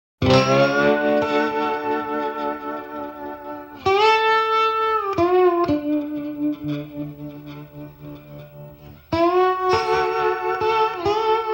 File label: Blues